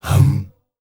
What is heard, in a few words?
hum hum, S. African world voices; ILIO; Vocal Planet